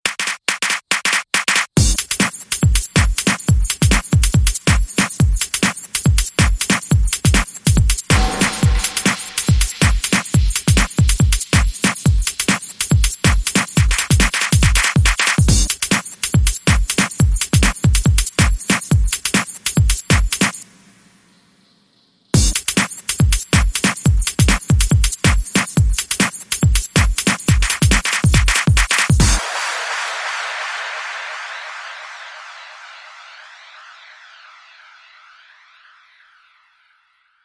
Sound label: Nano Beat